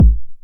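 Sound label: 909 BD tape, kick drum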